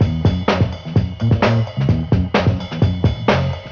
fonky bassline, drum loops, funky

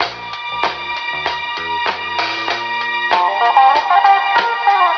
guitar and strings country cooking, Phonky Loops

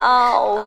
"oh"

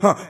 huh male, Voice

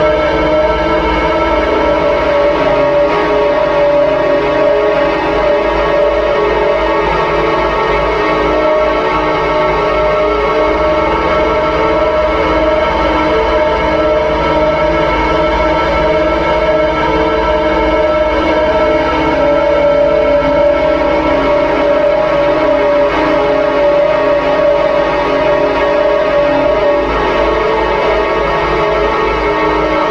dissonant yet melodic, Rumbles

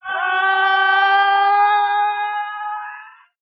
scream male upwards; ooh ahh

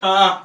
"ah", Voice

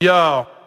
uh

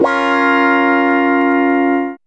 wahhhh, Guitar; Notes

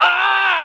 "ah" pain, Voice

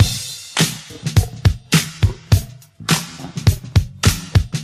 heartbeats, funky drum loops

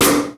snare, Real; Snares

